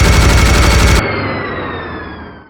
minigun fire; p4u; aigis

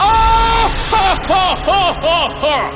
laugh; redd

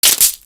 break; soj-garan

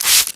luminol

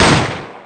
loud bang

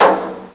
tong

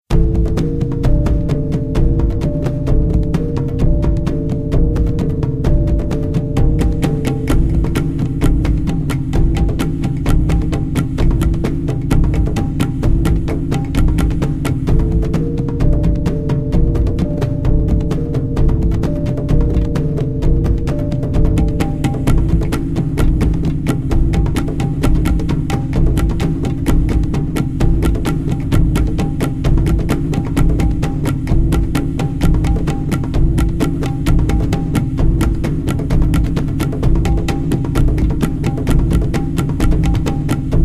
Tension

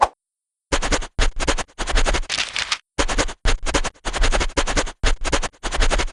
taking notes; Mamemomi

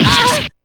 damage; p4u-naoto

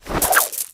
pre point; Nahyuta

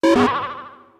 wrong; MysteryRoom

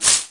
shook

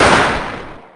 gunshot

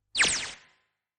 switch tv sound

